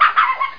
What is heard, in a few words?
small dog